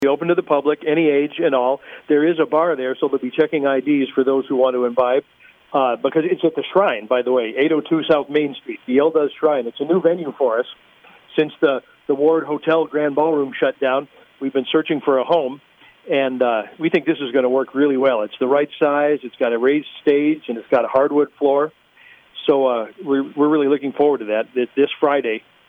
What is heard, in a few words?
Jazz Swing Party